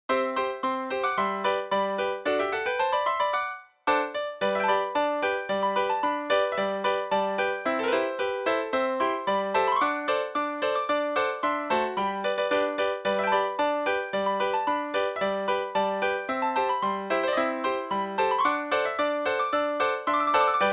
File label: Jingle Bells, draaiorgels